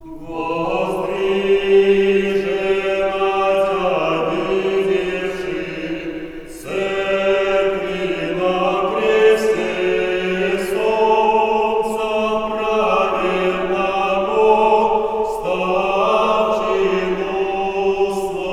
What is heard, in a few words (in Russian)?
Ирмос 4 песни 4 глас